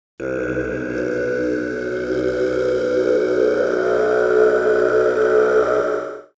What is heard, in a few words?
evil folk sounds; dance